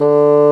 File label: DOUBLE REEDS; OMI - Universe of Sounds